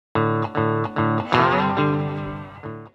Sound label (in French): gloups; MOBICLIC174